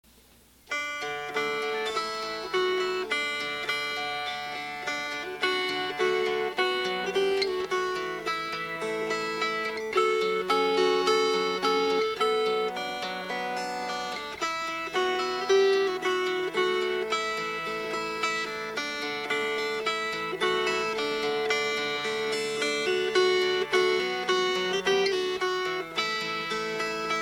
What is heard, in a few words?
Fingerpicked; I Know Where I'm Going